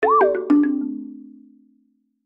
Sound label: Safari MMC insert; Nokia; Audio themes